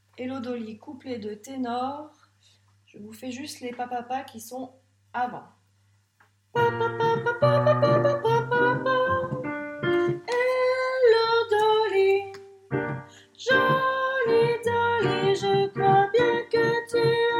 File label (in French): ténor, anglais; pupitre tenors; couplet 1 final; enregistrements de travail